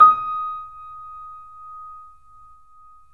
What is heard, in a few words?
3D Pianos Yamaha C7; E-MU Producer Series Vol. 5